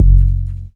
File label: Let Roll Bass - 2B; Jungle; Blu Mar Ten; Jungle - 1989 to 1999